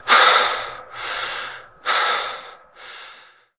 Prisoner Catch Breath

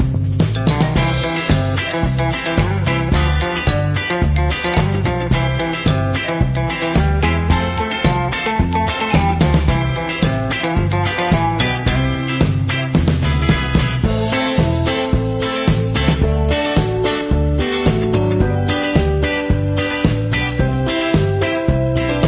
Level Theme

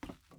wood step

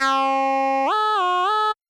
Beat

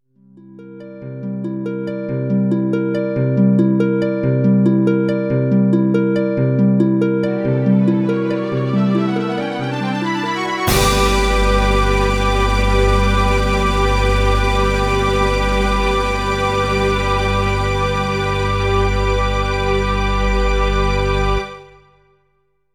Boss Crystal Collected; bomberman generation

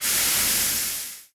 Fog; ChooChoo Heroes

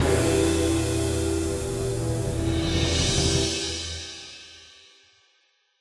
Fail; Asylum Drive

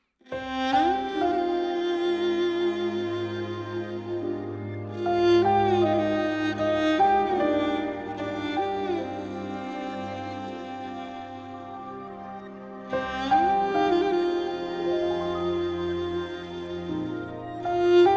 Horse-Head Qin; Ni Shui Han Jiuguang Hanlin

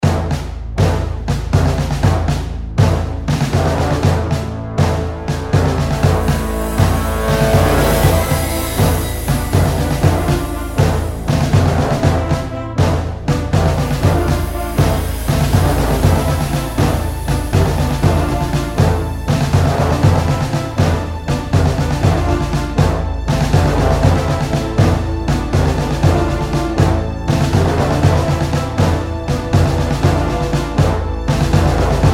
Menu Military; Dustoff Heli Rescue

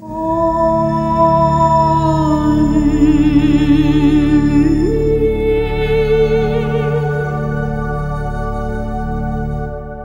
Sting Opening; Armello The Bandit Clan

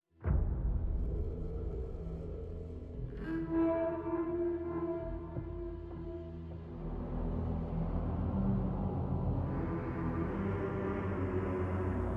Crash; Nautiloid

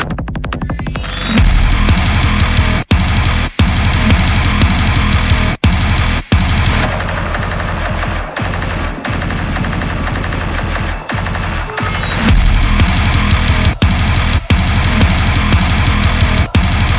heavy; SkullHacker heavy (short); Doom 2016; battle loops

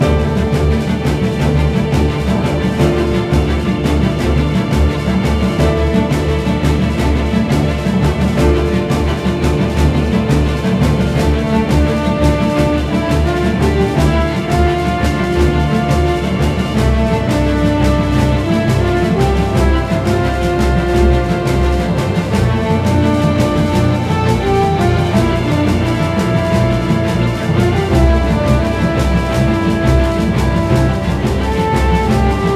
Normal Battle Loop

